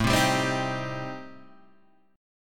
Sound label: A Minor 7th Flat 5th A, Guitar, Standard; strummed; JGuitar